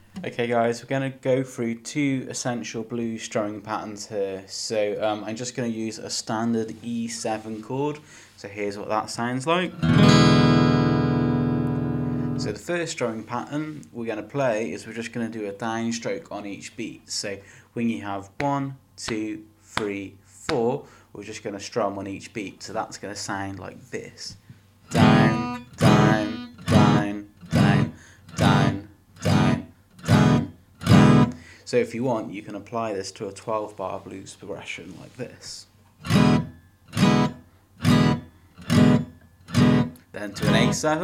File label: Blues Guitar